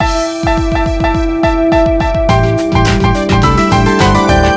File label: Regress (Warning)